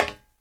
Metal; kult

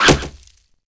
arrow wood

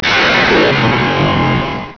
Power Up

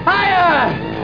fire

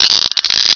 Crack; Deep Fighter

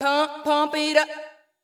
VOICE GRL HOUSE 125 A, House Voice

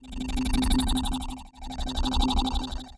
teleport; Szkola Koziolka

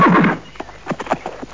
horse mount; szenarion

